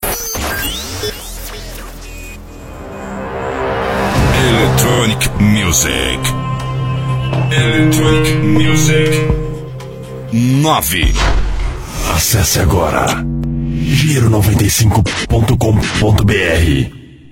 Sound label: Electronic Music